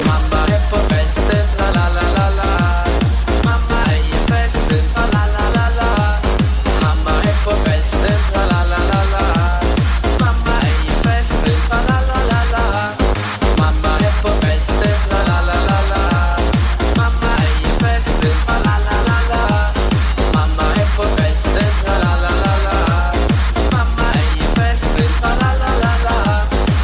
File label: new norwegian festen tune ringtone